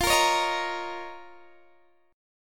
F 7th Suspended 4th Sharp 5th F down Guitar DADGAD